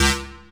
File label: stab; Dirt-Samples